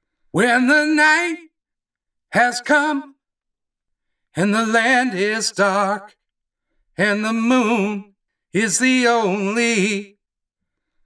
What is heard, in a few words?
singing waiter